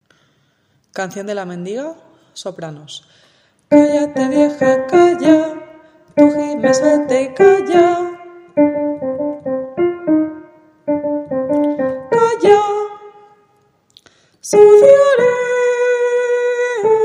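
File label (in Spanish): mendiga soprano; Bodas de Sangre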